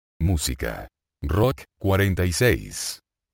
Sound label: rock